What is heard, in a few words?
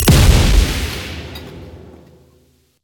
Mine Explosion